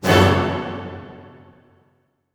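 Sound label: F# minor fortissimo, orchestra hits; Sound On Sound - Peter Siedleczek's Orchestra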